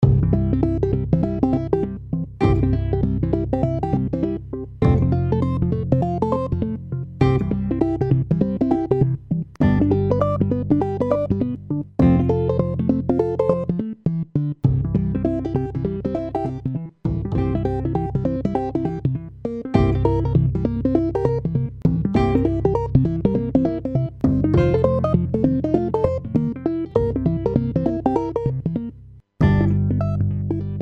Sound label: Hummingbird blues